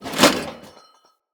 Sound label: Corrupted Battle Dog Foley Jump